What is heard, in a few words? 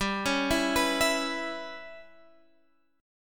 G 6th Flat 5th G, Guitar Open F; G6b5 arpeggiated